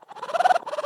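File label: Woodwind Pigeon Reverse; Physicist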